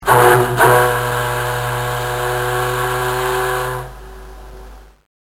factory whistle

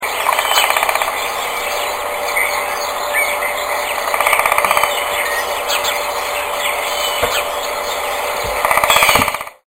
Flicker Drumming

